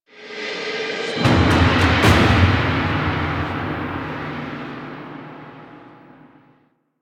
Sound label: Plains Fishing Success Small; Warframe; DE; Eidolon